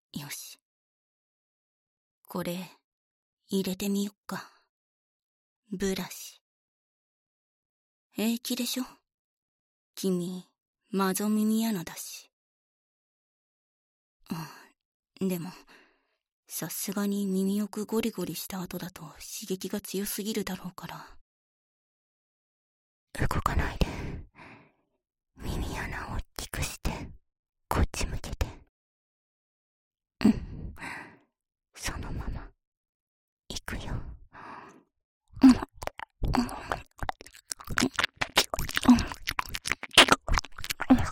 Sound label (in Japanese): 【右】唾液ブラシ, 耳かき; 耳かき小分けトラック